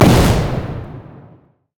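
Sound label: fireworks missile barrage; Fireworks Corki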